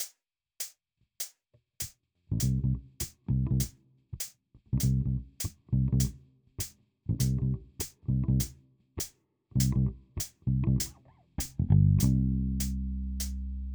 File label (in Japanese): 16ビート ハネる